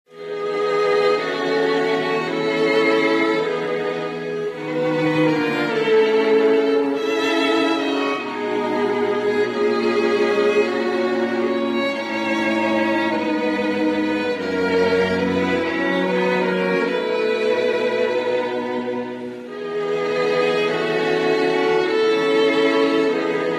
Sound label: quartet intro; ringtone